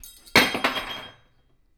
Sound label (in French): fourchette tombe